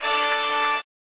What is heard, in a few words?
dancing; Samsung Galaxy S10; AvatarEmojiSticker Beyond